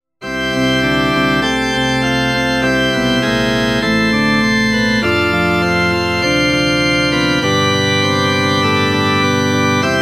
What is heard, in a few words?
chorale with nct